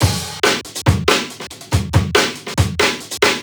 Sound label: Riddim Drum Fill 140